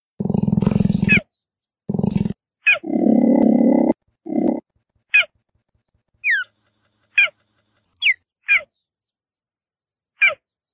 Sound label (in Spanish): Sonido Cheeta Guepardo mezcla